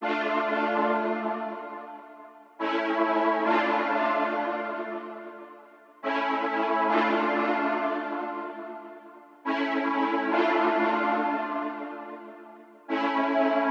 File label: OB-6 chords, 140 BPM D Min; Merciless